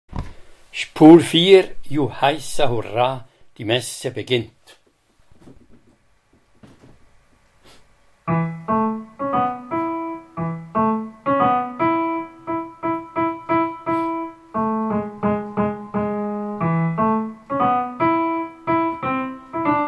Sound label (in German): Bass; Wien